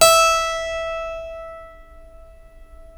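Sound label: E4 clavinet left, Keyboards Acoustic; USB Soundscan vol.17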